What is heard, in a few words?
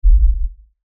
LFE Ultra LFE Hit, Lfe Ultra Low Bass Hit Impact; Deep Tone